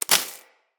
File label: Bullet Impact Debris Grass; Weapons